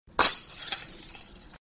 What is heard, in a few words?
Disc golf Chains